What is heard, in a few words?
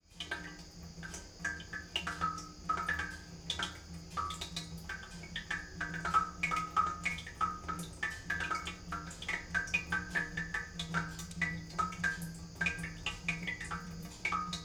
Fountain